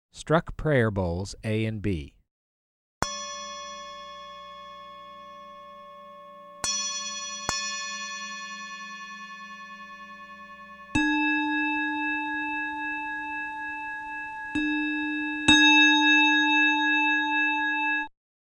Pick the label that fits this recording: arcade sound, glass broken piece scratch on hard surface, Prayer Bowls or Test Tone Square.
Prayer Bowls